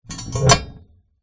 industrial lever metal old rusty pull

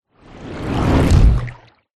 fantasy magic spell dark wet watery whoosh

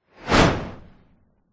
sound design whoosh fast whizz powerful; zapsplat

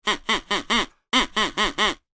cartoon duck quacks whistle

